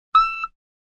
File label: Robot Beeps - single beep; Milt's Robot